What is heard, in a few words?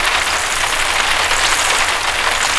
rain windshield; Quantum Redshift